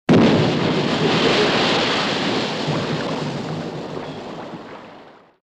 zvuk vzryva v vode

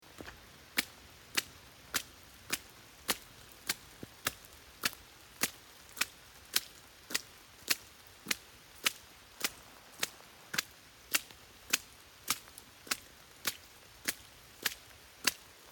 shagi po luje asfalitu

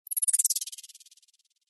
ne plohoy variant s poyavleniem

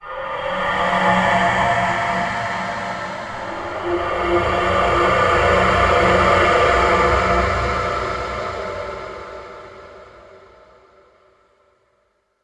dyhanie smerti zvukovoi effekt

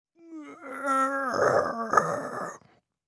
zvuk smerti

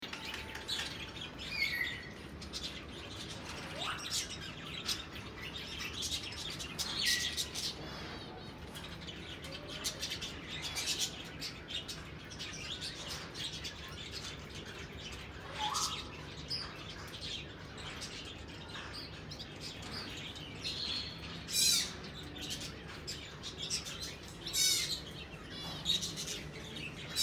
zvuk popugaev v zoomagazine

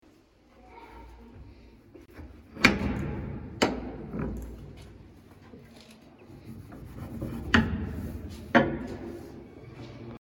zasov dveri; Эти звуки были записаны в замке, который использовался как тюрьма или темница

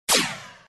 svist puli

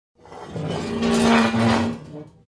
tyaj stul metal

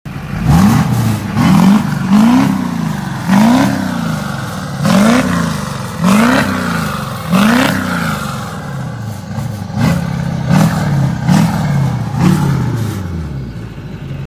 vyhl pikapa

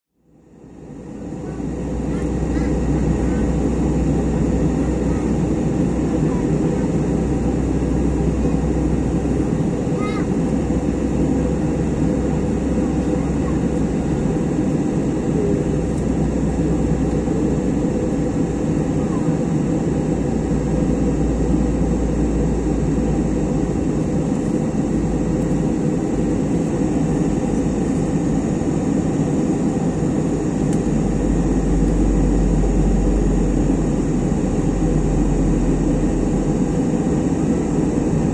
shum vnutri letiashego samoleta; Сигнал в салоне самолёта, звуки двигателя и другие шумы; zvuk samoleta

